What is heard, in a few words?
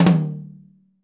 mid tom, drum; 90s sample CD